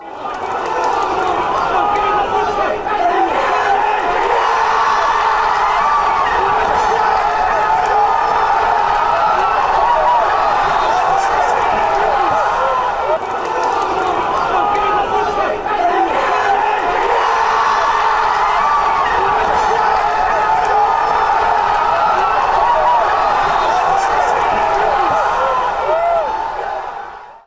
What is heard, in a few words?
ireland score fanchants